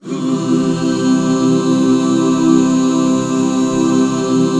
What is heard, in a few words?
C MAJ, Back Chords; Sound & Vision - Gigapack II CD 1